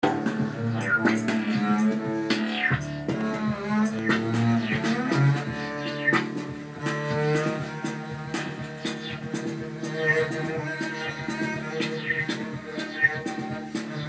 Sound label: Hymn Trio